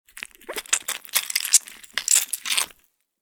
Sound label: Squeeze a lemon